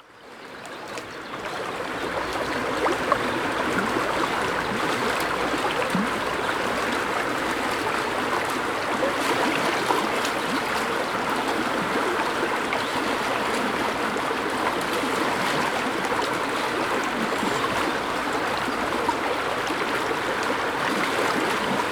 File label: river bubbling; best service Blue Box; water fx